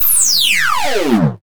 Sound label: laser; prosoundfx